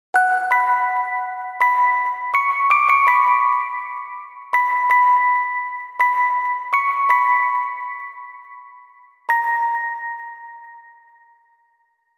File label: Music Box; Grimoire Song; Rune Factory Frontier